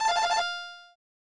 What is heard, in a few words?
Selection Jingle